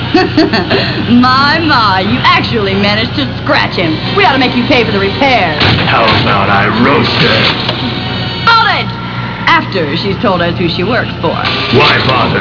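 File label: scratch; You actually managed to scratch it; Boomer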